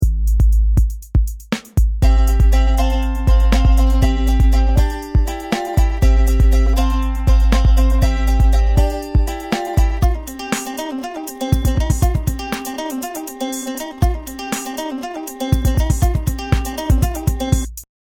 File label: Music